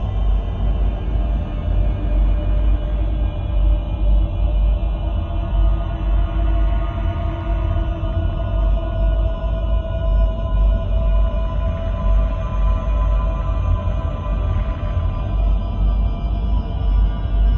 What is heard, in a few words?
synthqueen ship starbase idle; Stellaris; machineage